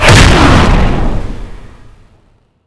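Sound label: explosion; chainsr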